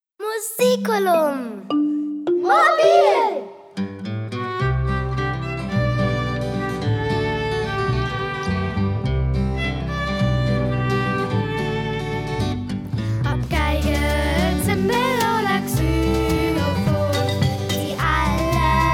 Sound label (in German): begruessungslied; musiculum mobil